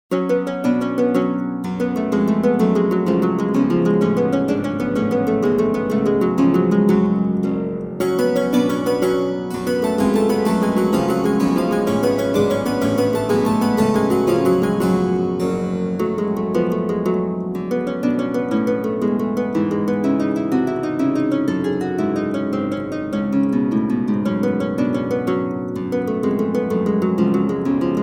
German theorbo-harpsichord; J.S. Bach; bwv995-f; Gavotte 2

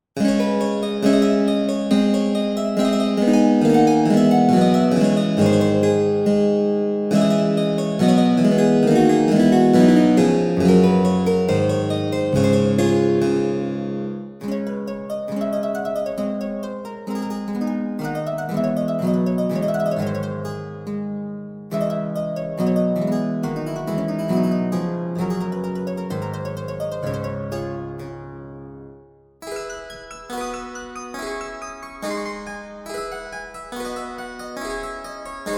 Dutch Harpsichord; J-P-Sweelinck; Malle Sijmen; 2008

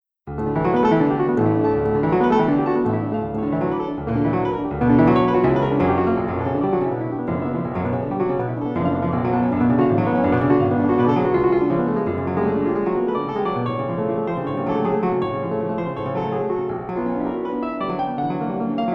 Pianoforte, Praeludium; Carl Reinecke

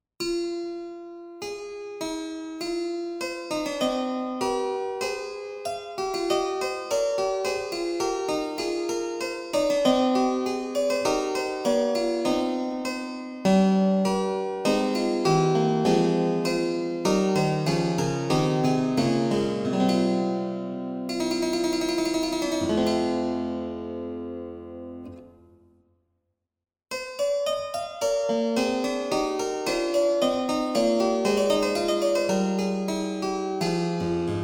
Italian Harpsichord; Giovanni Maria Trabaci; Versi 1-2-3 cromatico; 1615

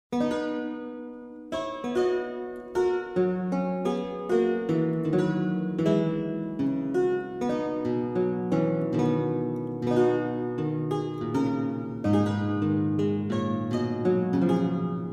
German Lautenwerck, Aria; Dietrich Buxtehude

